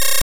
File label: laser, 8bit; カタナ１